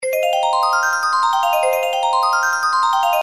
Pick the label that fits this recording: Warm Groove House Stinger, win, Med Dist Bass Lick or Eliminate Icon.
win